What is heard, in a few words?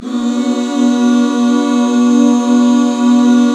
B MIN, Back Chords; Sound & Vision - Gigapack II CD 1